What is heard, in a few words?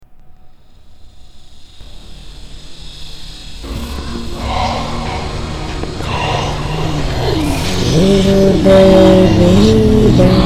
mockingbird hiss; creepy sounds